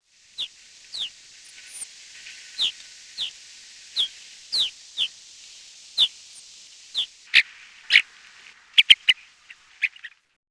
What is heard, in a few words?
brown capped rosy finch